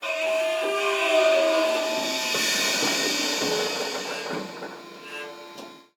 Semi Truck Mac; Blastwave

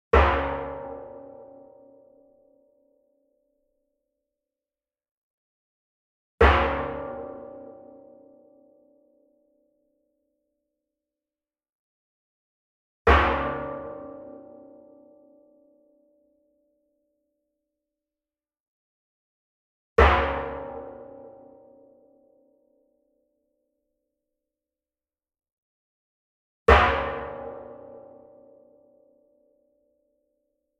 Metal Pan Hit Hard Beater Medium; SWEETENER; boom library; Cinematic Strikes Construction Kit

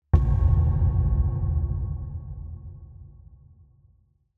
Impact Drum Dull Low Wooden Reverberant Hit; Hollywood Edge; sound designer's toolkit v2

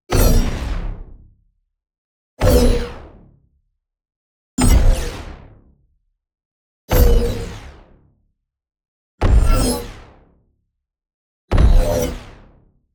NEON HIT LARGE Energy Surge; boom library

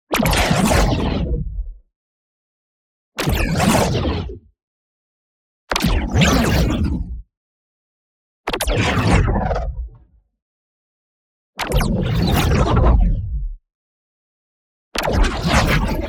ABILITY CHARGE Whooshy Fast Jump; boom library; Matter

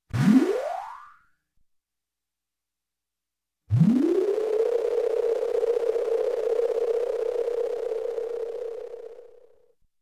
Space Lift Off, Electronic; Sound Ideas 2000 Series

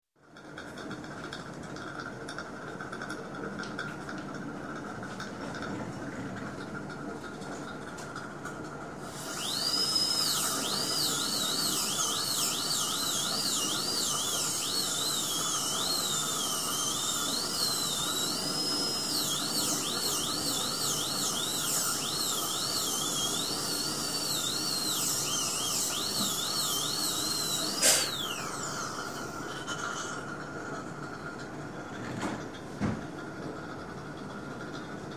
Dentist, Drill Attending Patient, Compressor; Compressor & Suction; Sound Ideas 2000 Series

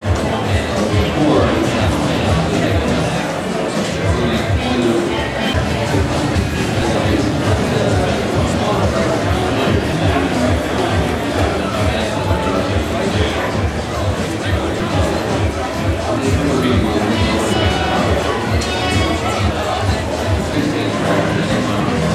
tradeshow music; surroundings; namm; best service Blue Box

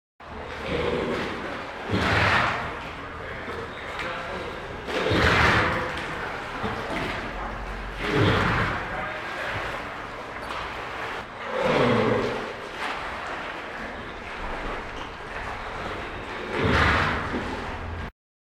Animal effects snorts grunts farm ambience

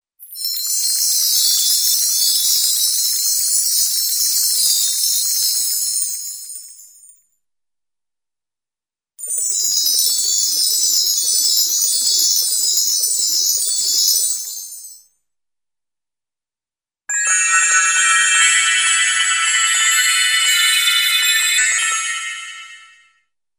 Metallic Chimes, Cartoon; Hollywood Edge; Cartoon Trax

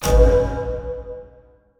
Affinity Bad; AudioWizards

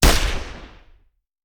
Hand Gun; Sound Ideas; Guns

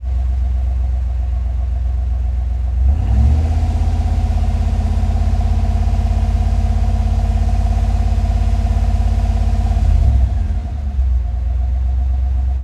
91 Firebird Fast Idle, Automobiles

